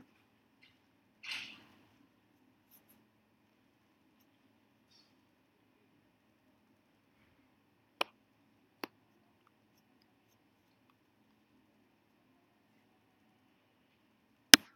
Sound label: hallway; Unseen and Unspoken lunchroom